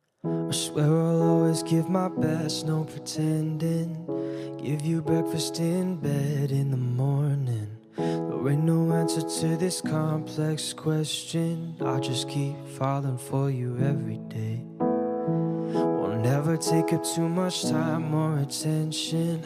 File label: Romantic Version